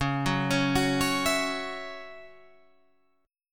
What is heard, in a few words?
Db Minor Double Flat 5th Db down Guitar Open G